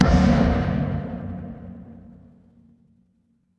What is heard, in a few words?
transition braam